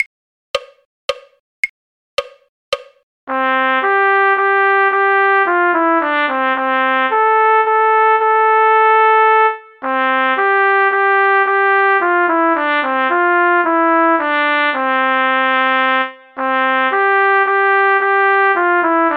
trumpet; folk

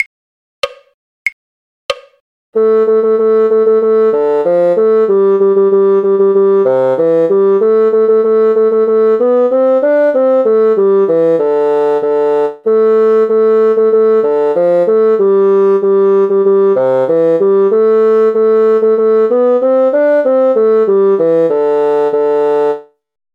bassoon; drunken sailor; folk

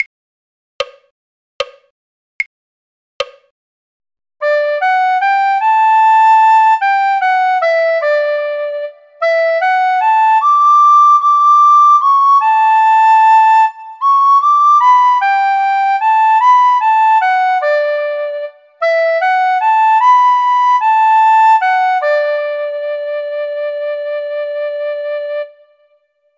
recorder; Barbara Allen; folk

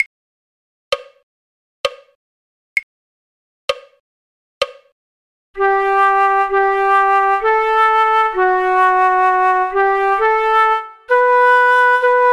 flute; god save the king queen; folk

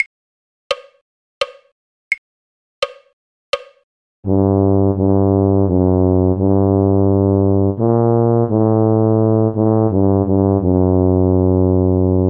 tuba; carols

